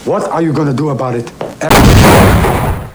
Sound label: exploding